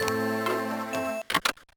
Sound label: Switch; Wario Ware Inc. Mega Microgame; gba